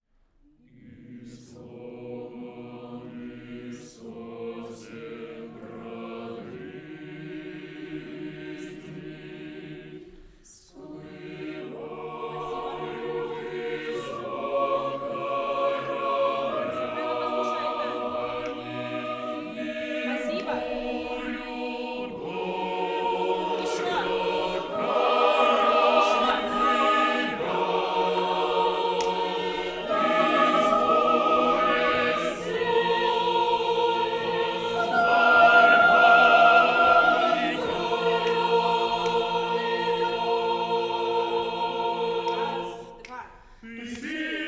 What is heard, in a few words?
brauner choir, neumann KM